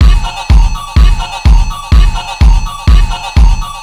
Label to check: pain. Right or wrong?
Wrong